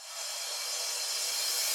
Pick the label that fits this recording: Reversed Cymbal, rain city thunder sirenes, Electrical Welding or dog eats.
Reversed Cymbal